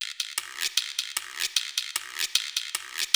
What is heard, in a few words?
076 Pop Perc Guiro; Pop Rock; World Perc; Percussion